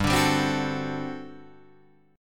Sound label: G Major 7th Suspended 2nd Suspended 4th G down Guitar Open G